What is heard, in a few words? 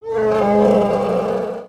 Pet Elephant Idle; creatures pets